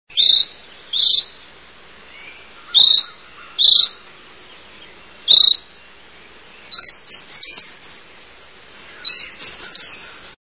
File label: Brown Creeper